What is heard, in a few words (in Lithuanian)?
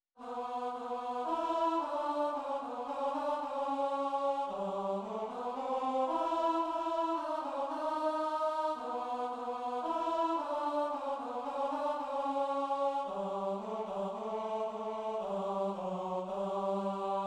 TENOR Šventas ŠV AUGUSTINO MIŠiOS